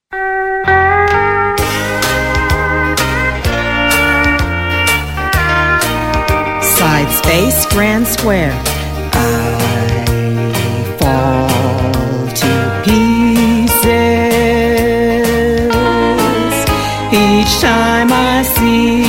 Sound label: vocal sample